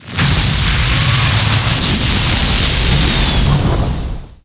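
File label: explosion building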